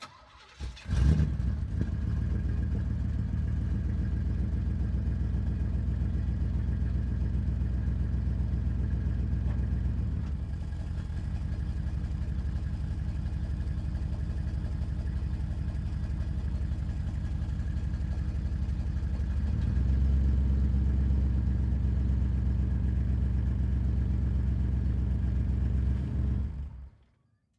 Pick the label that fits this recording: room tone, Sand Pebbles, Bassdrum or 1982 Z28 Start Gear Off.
1982 Z28 Start Gear Off